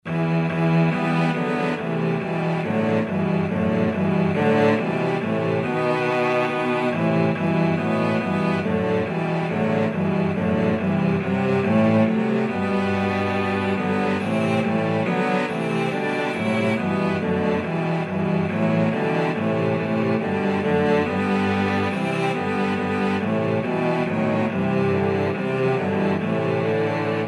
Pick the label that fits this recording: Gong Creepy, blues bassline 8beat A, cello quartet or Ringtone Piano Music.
cello quartet